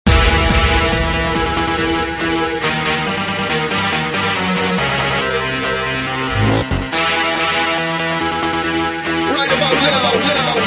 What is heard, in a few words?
Dubstep Remix